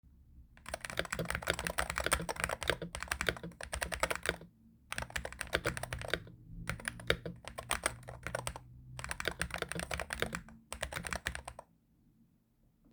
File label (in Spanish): teclado sonido marvo mg40; Marvo Magma M40